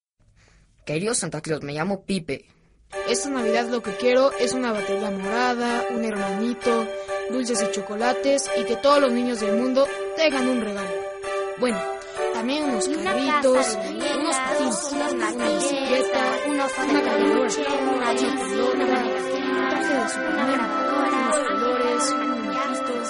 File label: pipe